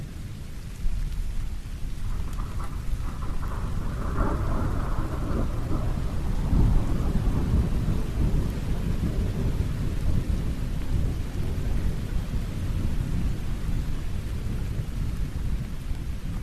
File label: Rain Music